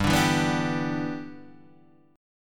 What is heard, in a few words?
G 6th